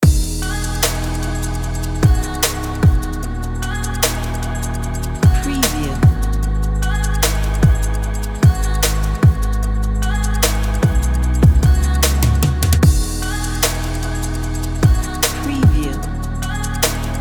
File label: modern future bass